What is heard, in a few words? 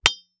Weapon Sword Hit Wood Dull; AdobeCC